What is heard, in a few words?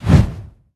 Mage Attack; Ember Lords